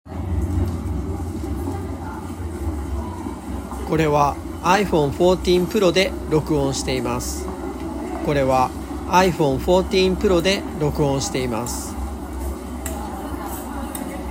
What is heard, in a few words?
sound iphone 14 pro